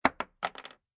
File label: Bullet Shell Drop Machine Gun Solid Wood; Machine gun empty shells fall on solid wood; Lynne Music